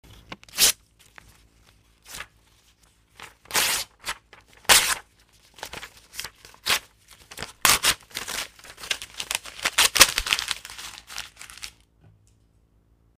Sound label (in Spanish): rompiendo papel